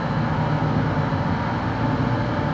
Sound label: bridge ambient; Dystopia; injection